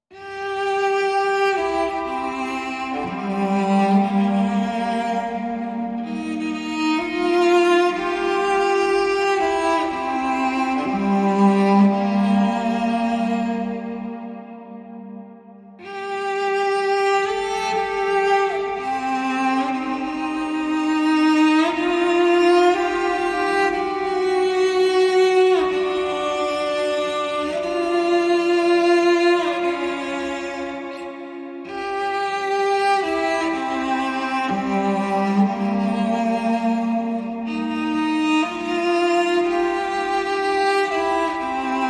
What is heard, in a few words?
Lead Cello Only